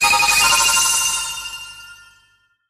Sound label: bonus wheel level up; koi princess